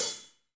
HH hh; Best Service Gigapack 2

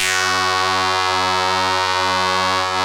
700 B-CH F#1, Korg; Back In Time Records; Korg Universe VOL-1

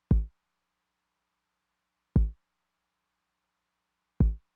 kick drum w accent, Roland TR-909; drum machine; Roland TR-909 Ableton Project

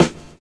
SD, snare, 70 s Breakbeats

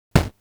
thud, Polivoks

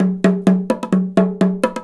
130 TIMB, Indian Traditions; USB Soundscan vol.45; AKAI

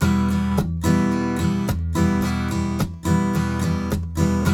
RIFF, Blues Guitar; ILIO; Fretworks

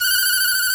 BON DEE F3

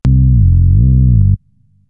Bend, Synths, BASS

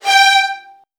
G3, Strings, VN 16TH; ILIO; Kirk Hunter

